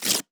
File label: Tearing Rustle